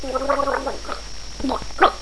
gurp; quake2; chastitymarks

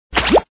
gause; quake2

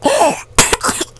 gasp; quake2; hamster

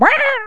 death; quake2

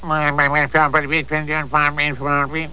death; quake2; penguin

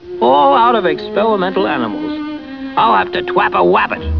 drown; quake2; fuddbeta

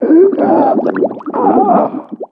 drown; quake2; bobafett

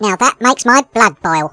death; quake2; karrot

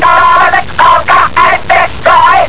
pain; quake2; dalekx

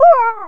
pain; quake2

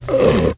gurp; quake2; fwwolf

